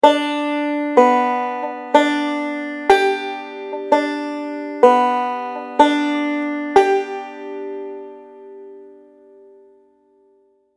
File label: Middle Lead Roll